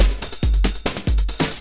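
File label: loop snare drum, 140 BPM, Drum & Bass; Ueberschall; Drum & Bass Resonance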